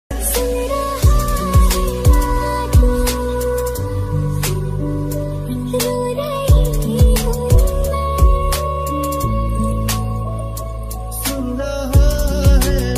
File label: hindi ringtone, caller tune, romantic ringtone